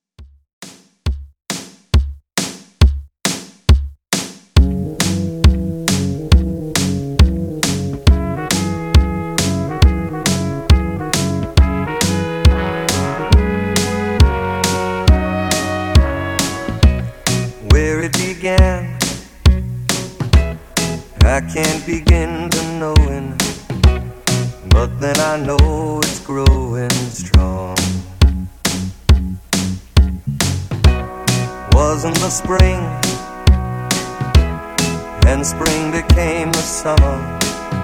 redrum

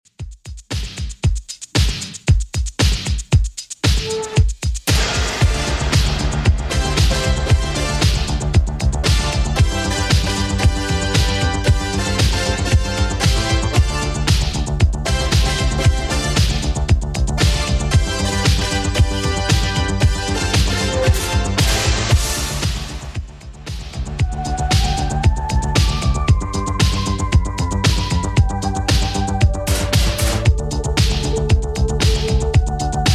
80s Redrum 115bpm

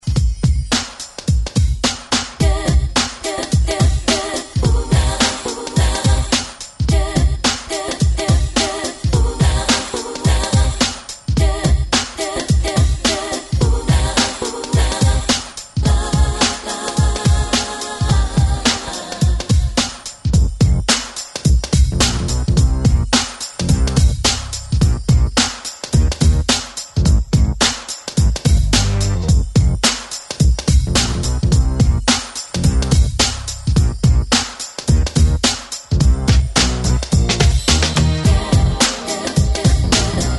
90s; Redrum

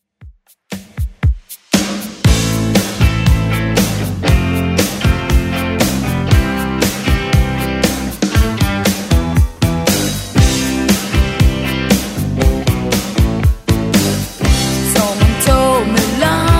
80s dance redrum 118 bpm

